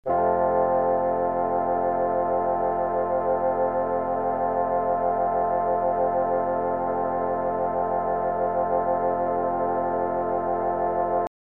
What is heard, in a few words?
f major chord